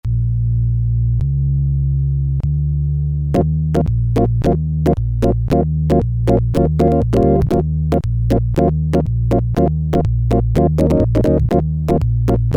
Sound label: Organ, Korg Poly-61M